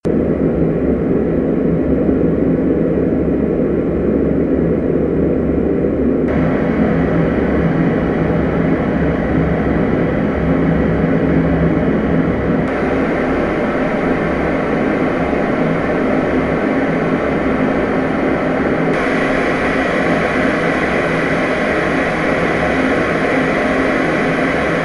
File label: Lectrofan EVO white noise